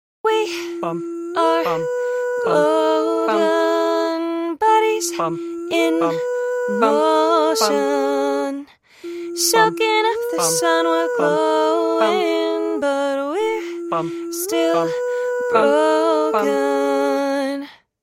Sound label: Beat (unprocessed); waves